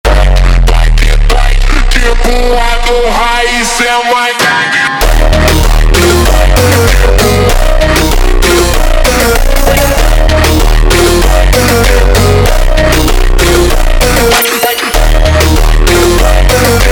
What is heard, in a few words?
Montagem slowed